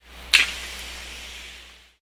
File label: 1 click, sperm, reverberant; Haro; biophony; Yukusam